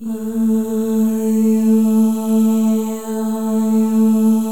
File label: MOVING V1, Symphony of Voices; Spectrasonics